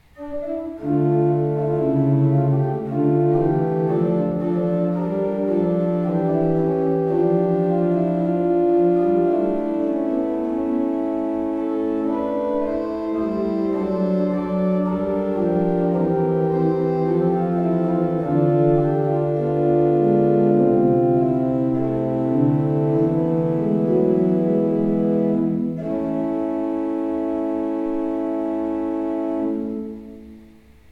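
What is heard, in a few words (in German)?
Kleuker 15 II P, pfeifenorgeln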